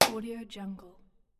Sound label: small metal hit